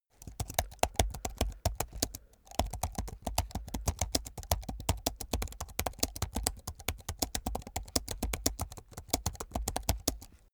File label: keyboard laptop type sequence hard